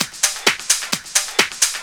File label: drum 130, techno trance drumloops